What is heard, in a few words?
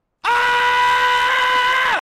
Spoon Scream